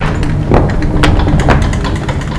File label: train